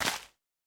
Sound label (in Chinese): 大型垂滴叶向下倾斜声